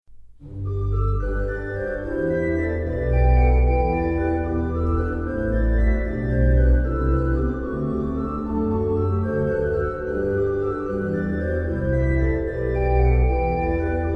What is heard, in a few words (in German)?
orgelspiel; stseverin